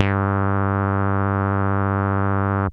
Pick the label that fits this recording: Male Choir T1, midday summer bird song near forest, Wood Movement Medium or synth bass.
synth bass